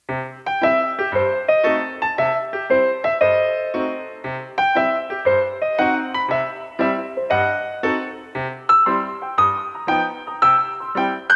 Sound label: piano